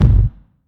big room kick drum sound g key, Royality free kickdrum sample tuned to the G note; kick drum samples